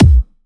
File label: 128BPM Kick; Vengeance Samples; VFH1 128BPM The Simple Kit